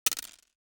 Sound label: DICE on Hard Wood, Throw and Roll, Mini, 3 Three Dice; Samples; ArticulatedSounds; DICE Sfx Party (Extended)